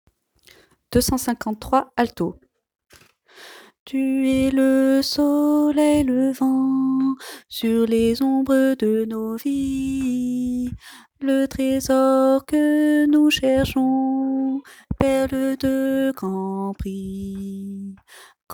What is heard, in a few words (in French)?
alto